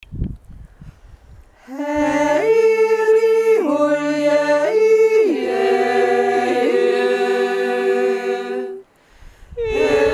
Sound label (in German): dr wurzhorner dreistimmig